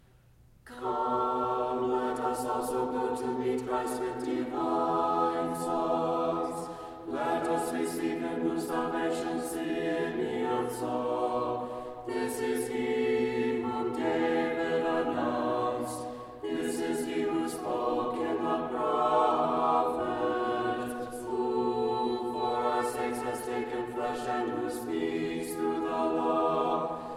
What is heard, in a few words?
Tone1 Common Sticheron Example SATB